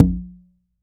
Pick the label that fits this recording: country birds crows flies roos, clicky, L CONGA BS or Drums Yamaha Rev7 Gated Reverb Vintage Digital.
L CONGA BS